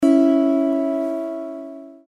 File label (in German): Zithermacher Ton A LAUTER